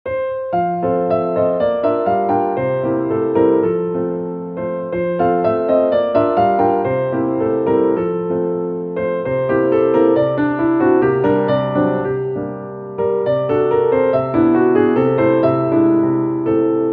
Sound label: piano; schumann